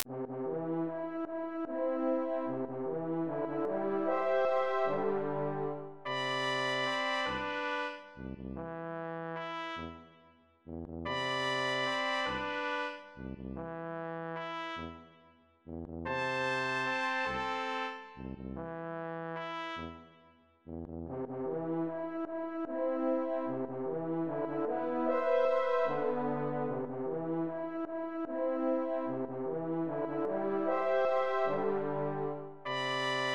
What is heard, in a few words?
melody example